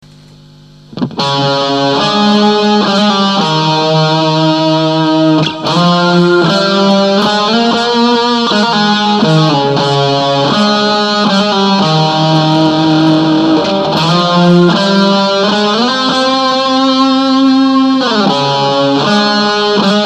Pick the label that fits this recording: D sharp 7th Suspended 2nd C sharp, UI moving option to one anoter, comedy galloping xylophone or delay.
delay